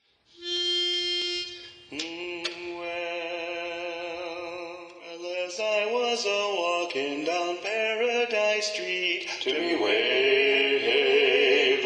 Blow the Man Down Bass predominant; sea shanties

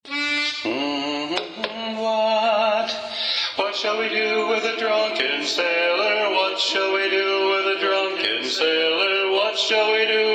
Lead Predominant; sea shanties; Drunken Sailor

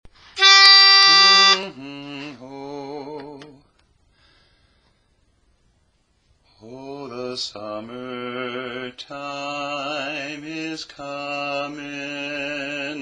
Tenor; learning tracks

